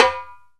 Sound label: Timbales rim; E-MU Producer Series Vol. 2 – More Studio Essentials; Composer; Timbales Xswitch